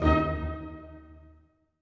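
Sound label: orchestra; Masterbits - Switch FX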